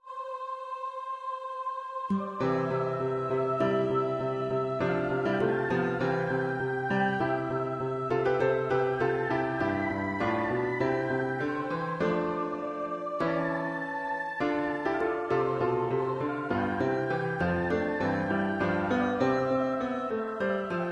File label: Guitar trio; Gallery of music generated by program Largo.; HAYDN, Joseph; Guitar trio 1/Animato